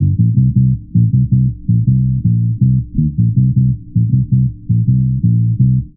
bass, Loops, Phonky

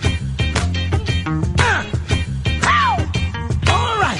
guitar all right in six, Loops, Phonky

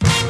funky

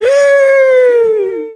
eee, Voice

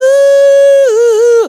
"ooh" SUNG

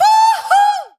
woo hoo; ooh ahh

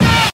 attack

